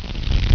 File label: book